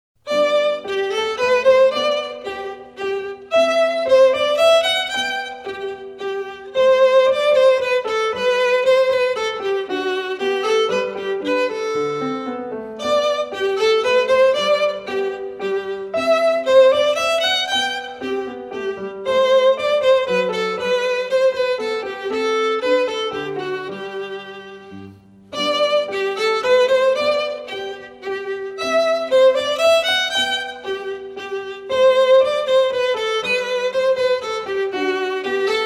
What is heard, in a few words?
Violin Minuet 3; Suzuki Violin Method Vol 01; J.S. Bach